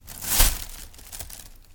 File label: lift plastic bag